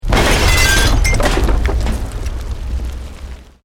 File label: Explosion Medium Brick Wood Glass; Created explosion effects, house, building or vehicle effects; Bomb; Bombs